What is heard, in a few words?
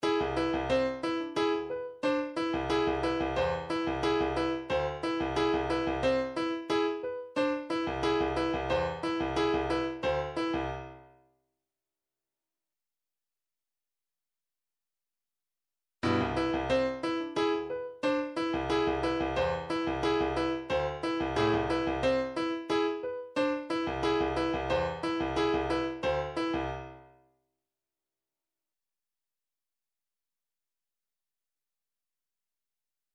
middle of the road 90 bpm